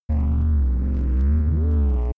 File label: low engine rumble